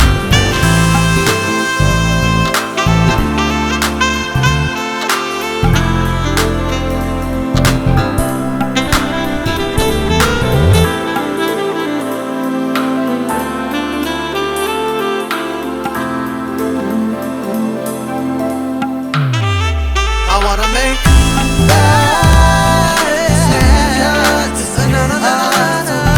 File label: Alto Saxophone